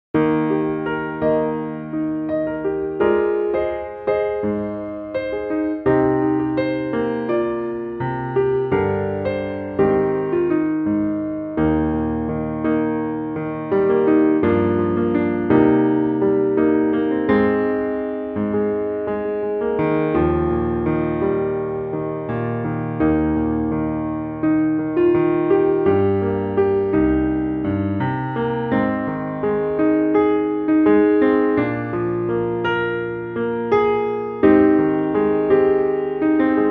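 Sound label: accomp, accompaniment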